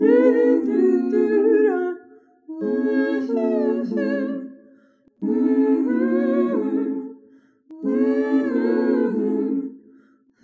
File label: Soft Soulfull Harmony 4 bar mix